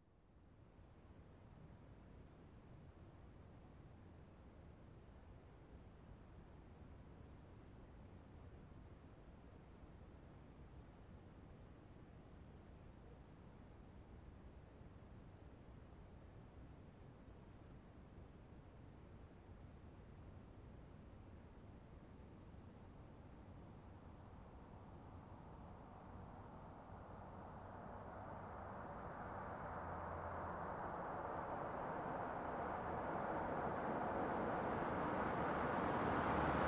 Car approaching and parking

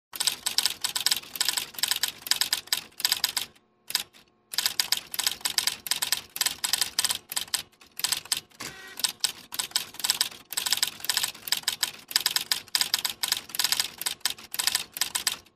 Fax Machine Scanning

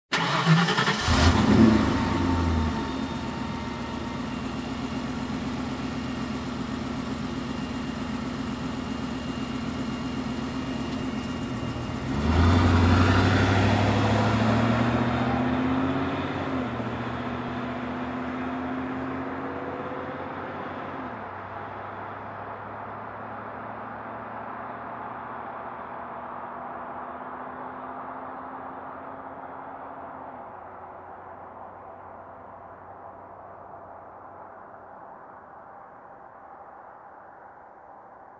Car engine ignition and drive away

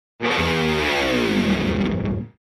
Rock guitar pitch down

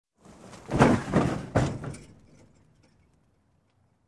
Wood Collision Sound

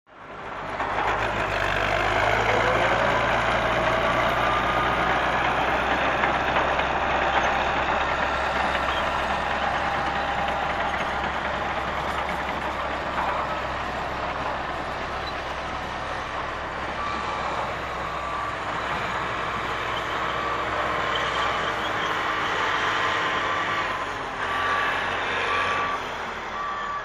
Bulldozer unloading in construction site

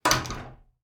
Glass door being closed

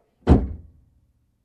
Closing Door

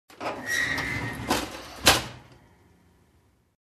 Steel Compartment close

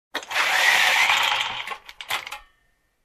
Camera Projector Roller

